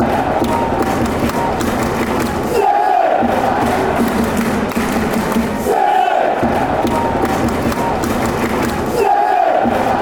clap, chants

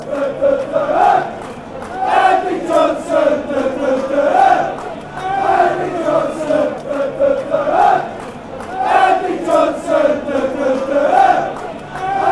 homage, fan chants; andy johnson

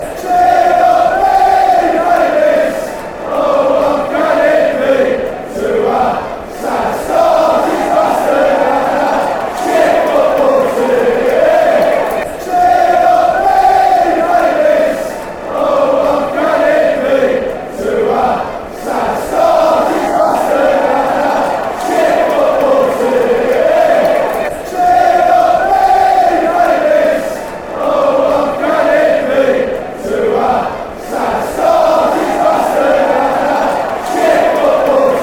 cheer, fan chants; billy davies

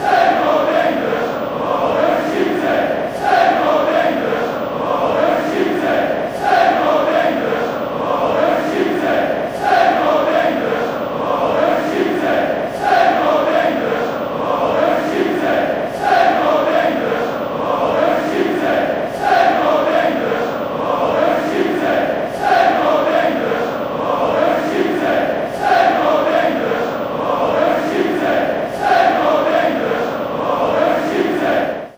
same old english, fan chants

